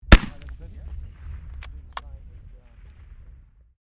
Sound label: RIFLE 6MMBR FIRING; Rifles, non military; Rifle Sound Effects; Rifles (Sound Effects:Guns)